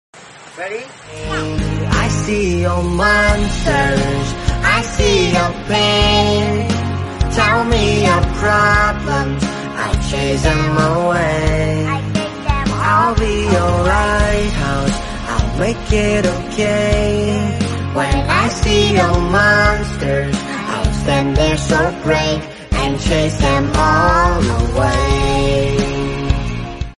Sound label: English Song